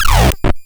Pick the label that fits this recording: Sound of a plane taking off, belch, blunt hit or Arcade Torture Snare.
Arcade Torture Snare